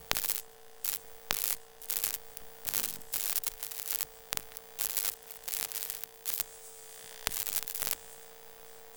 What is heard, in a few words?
Hum Buzz Buzz; Detunized